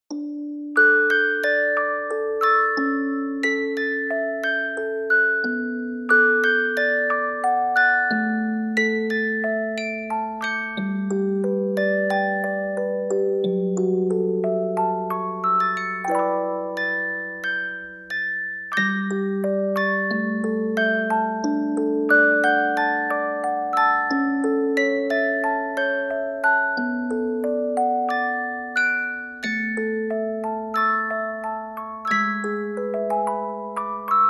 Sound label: Orgel Ver.; Art as ♥